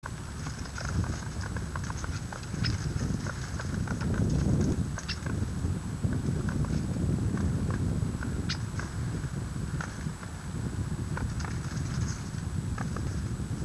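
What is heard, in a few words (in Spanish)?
Doradito Común, Pseudocolopteryx flaviventris, Warbling Doradito; cantos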